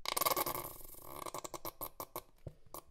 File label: squeeze toothpaste